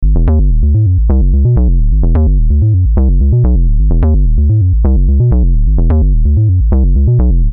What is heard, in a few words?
128 Synth Bass